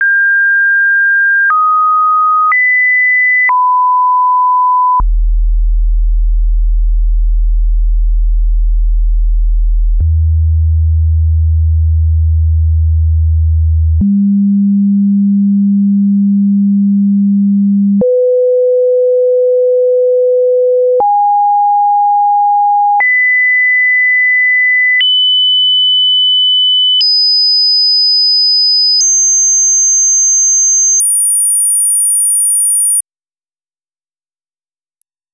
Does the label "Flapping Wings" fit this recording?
No